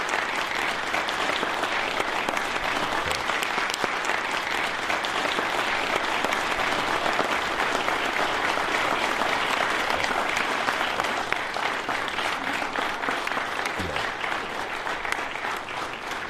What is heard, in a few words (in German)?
Beifall